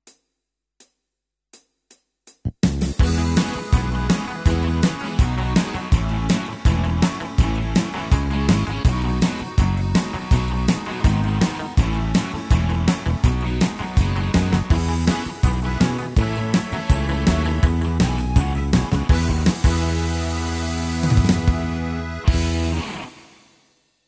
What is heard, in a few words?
Rock Beat, styles tyros2